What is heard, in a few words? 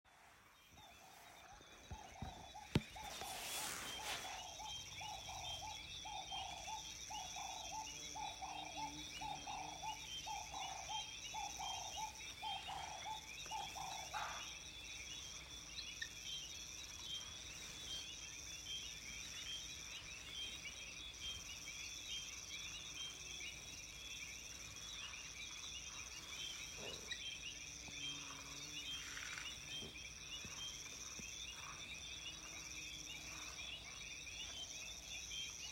Birds sing a song in Kenya